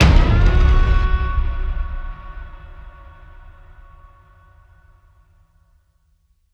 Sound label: Impact